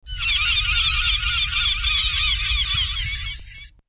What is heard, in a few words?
Seagull